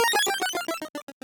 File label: GameOver; Shenlong